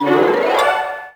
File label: Pickup